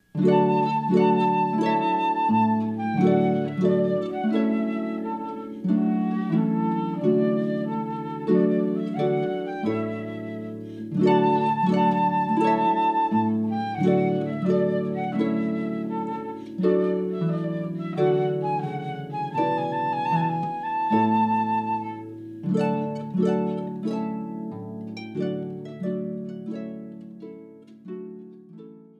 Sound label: Harp & Flute; Purcell; Trumpet Tune